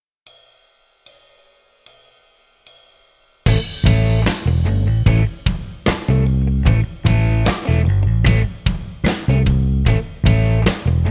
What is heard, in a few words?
Major pentatonic backing track, blues; guitarist presents blues